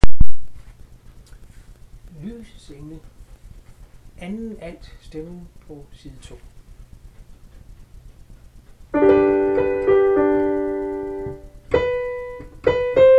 lysets - 2. alt

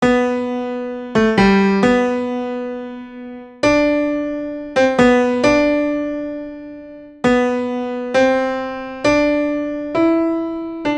tenor; Amen

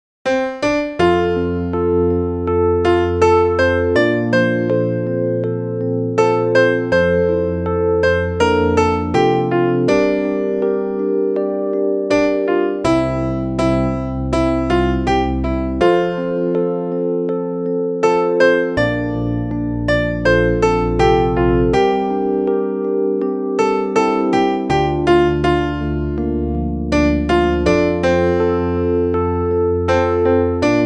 sopran

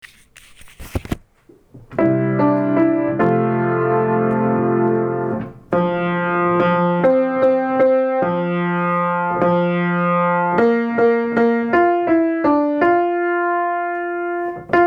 bas; Fuglene letter

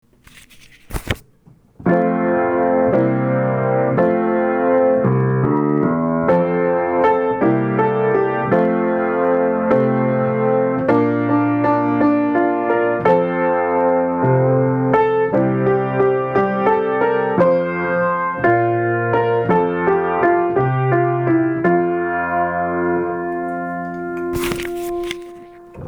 alt ( melodi ) stemmen; Fuglene letter

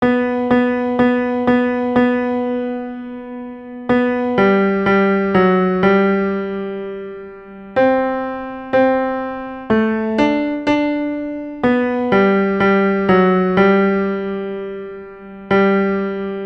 tenor; Dagens sidste gloed

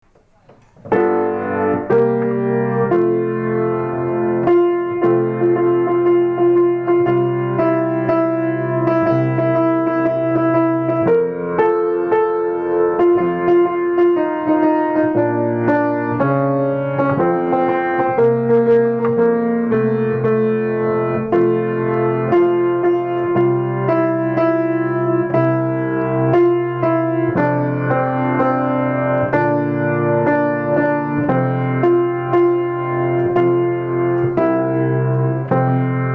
alt stemmen; stemmefiler

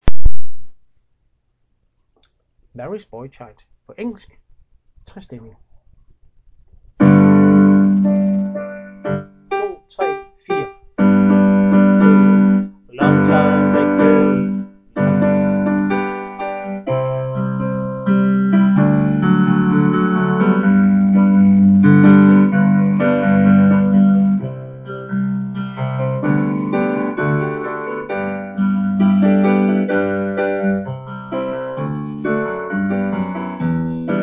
sab akkompagnement

